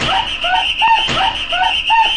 frenetic brazil whistler, DRUM LOOPS and BREAKS, 220 bpm